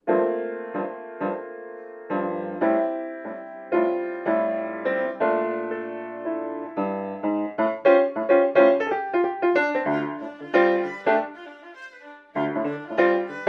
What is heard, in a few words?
acoustic version; Funkxanaduck